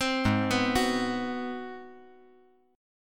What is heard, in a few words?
G sharp 7th Sharp 9th; G#7#9; arpeggiated; 5,8,7,9; JGuitar